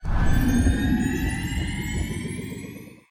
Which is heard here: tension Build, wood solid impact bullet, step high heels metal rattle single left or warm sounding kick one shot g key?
tension Build